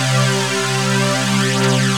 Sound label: Pad, 8B; Jungle; Blu Mar Ten; Jungle - 1989 to 1999